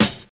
nineties airy sizzle hip hop acoustic snare g sharp key